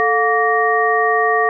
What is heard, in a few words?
golden ratio chord; Gold Sign Chord